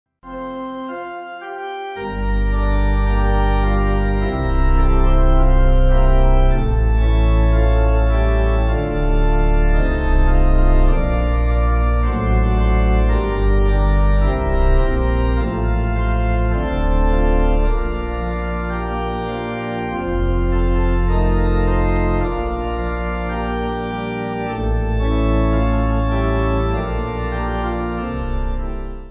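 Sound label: Pipe Organ, Music Backing Files for Churches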